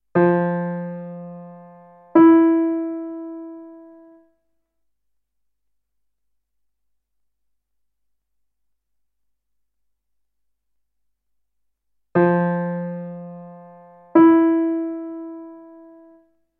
Septime

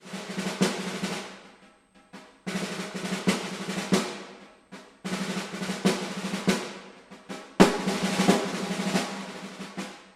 Kleine Trommel

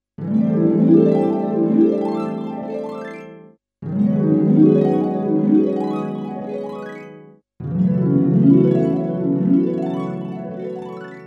Harfe

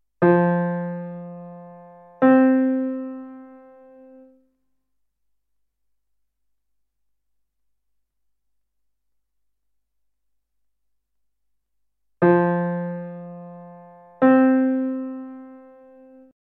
Quinte

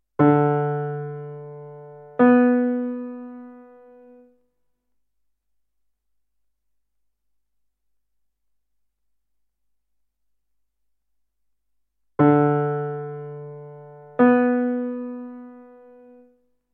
Sexte

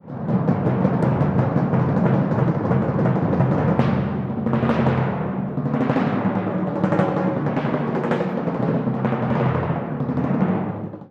Kesselpauke

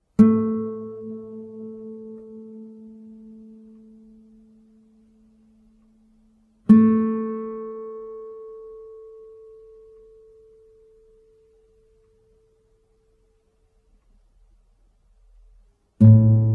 klang

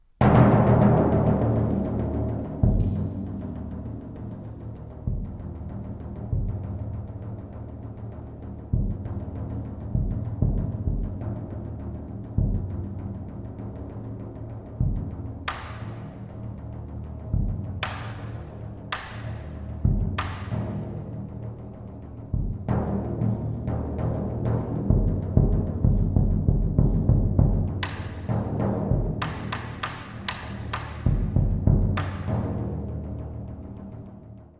Grosse Trommel

